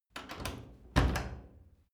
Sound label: wood door old lock